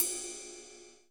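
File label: dry ride cymbal, dry kits; Crypton - DrumBase5000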